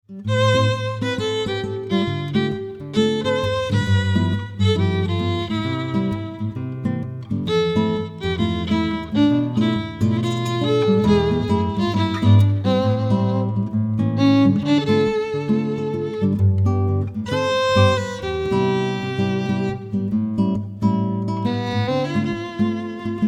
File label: guitar violin